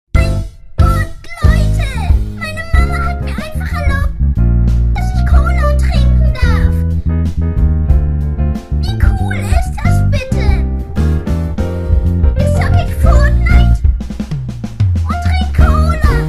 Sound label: bass